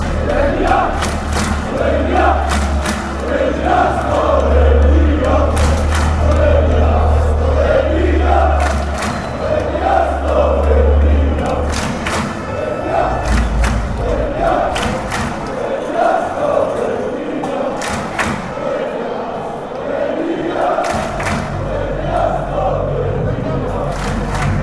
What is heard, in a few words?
slovenia chant